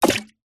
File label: game designed water hit thump